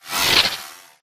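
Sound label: Drone Movement Turn; Titanfall 2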